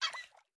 美西螈空中待机声

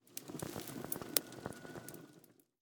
宝库环境声; ambient

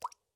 滴水石锥水滴落声

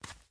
幼年海龟爬行声

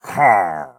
待机声; 掠夺者

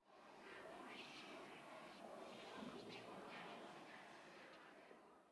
灵魂沙峡谷低语声; ambient

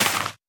甜浆果丛破坏声

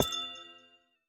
紫水晶簇放置声

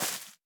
樱花树叶破坏声

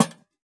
灯笼放置声

